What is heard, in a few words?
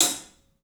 club hat; 3D Sound; 3D Drums